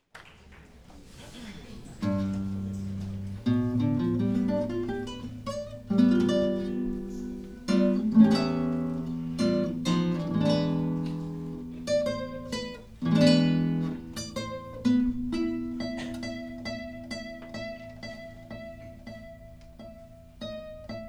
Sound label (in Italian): Preludio Chitarra sola; Concerto di Natale